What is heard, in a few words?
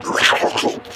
Ninja Attack; unsighted; enemies